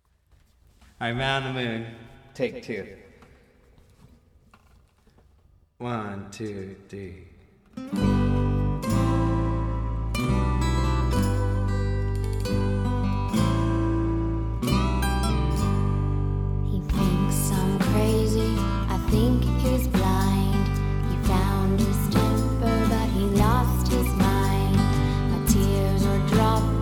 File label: w drums